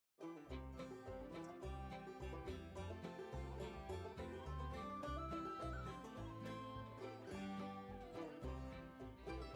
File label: Dixie Country Folk; Roblox Driving Empire Song/Radio